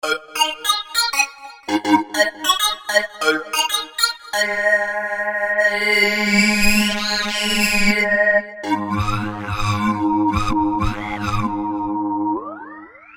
Postfilter RM

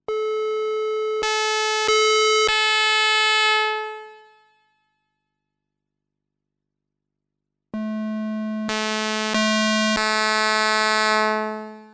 syrinx osc; synton

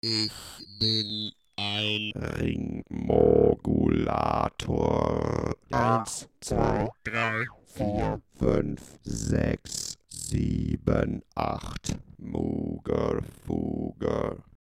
mf102 conseq vox, moogerfooger

